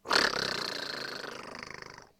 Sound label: gator howl